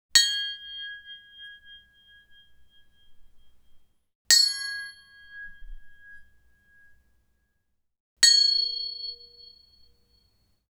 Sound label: clang resonance